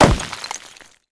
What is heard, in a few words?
Bullet rock Impact; Hand Guns; Olivier Girardot; Hand Guns Sound Effects Pack